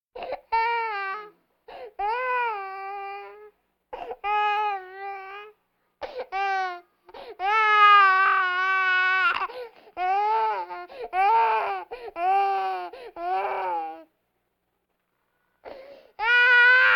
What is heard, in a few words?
Vocal effects breathing Moaning Baby